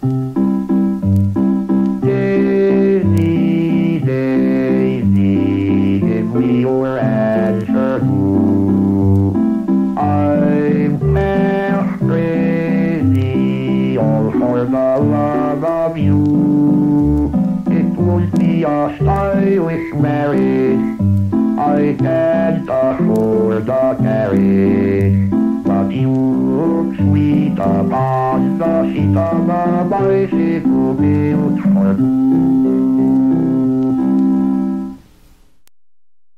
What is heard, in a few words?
Daisy Bell IBM 7094 mono